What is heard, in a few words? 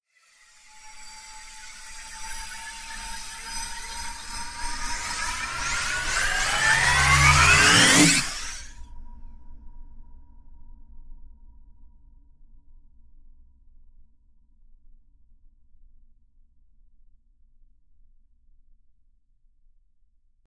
Risers Hyperbolic; Gothic Storm Music; Advanced Sound Design 2012